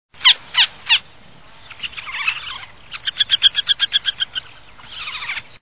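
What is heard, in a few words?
Montagu's harrier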